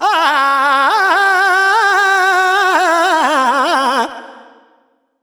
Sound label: Expression, Vocals Male World Pop; Sonic Foundry (Sony Creative Software)